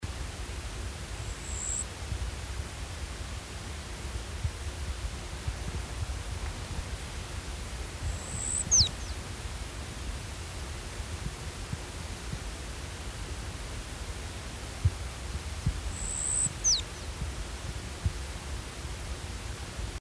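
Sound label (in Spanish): yal chico, Phrygilius plebejus, Ash-breasted Sierra-finch; cantos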